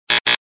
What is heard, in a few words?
error